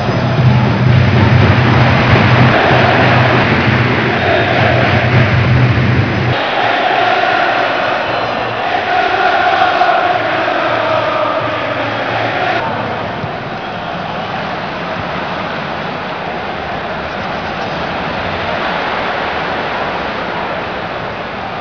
crowd; stadiumgsu

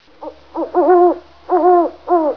owl

